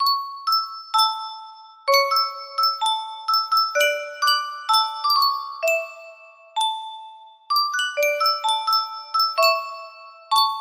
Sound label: yunsheng custom tune music box